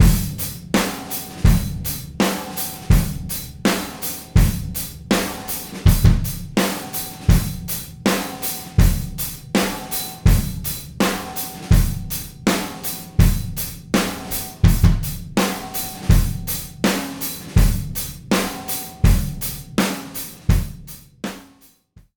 solid drums; IK Multimedia - EQ 73; T-RackS; Equalizador e pré-amplificador Classe-A discretos para T-RackS

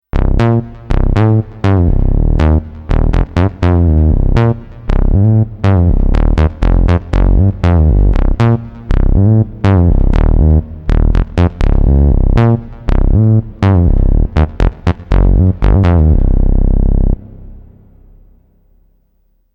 Funk Saw; uno synth pro desktop

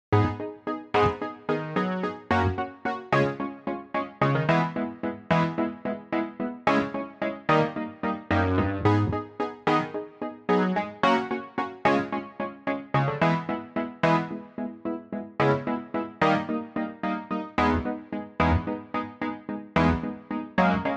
super wurly 120; sampletank4; electromagnetik

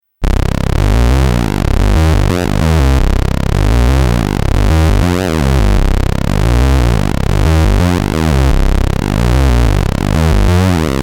Just one OSC pulse mod; uno synth pro desktop

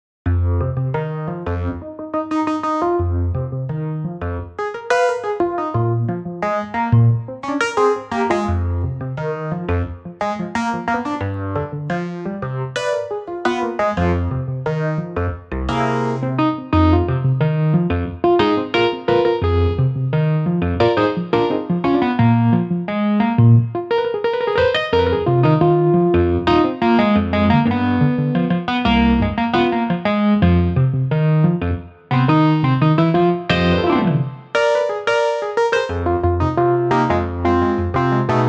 Wurly Model 120; sampletank4; electromagnetik

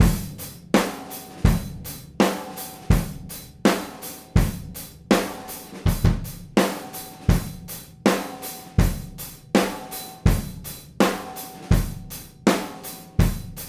solid drums unprocessed; T-RackS

